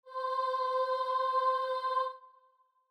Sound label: starting note, voice; following the dynamics